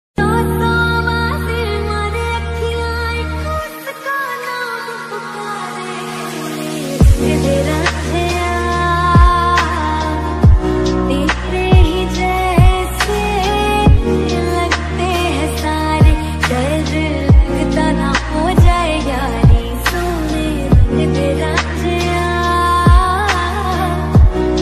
south bgm ringtone cute girl love story sms ringtone new hindi song ringtone